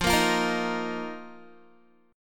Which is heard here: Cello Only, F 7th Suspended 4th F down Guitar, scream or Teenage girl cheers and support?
F 7th Suspended 4th F down Guitar